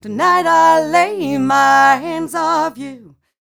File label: f mel d flat, fem Vocals; Mutekki Media; Diamond Vocals 2